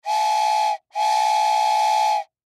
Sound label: Toy Train Whistle, Toy Train Whistle Blow Double; Toy Trains; Foley; Sound Effects:Toys; SoundEffects-Blastwave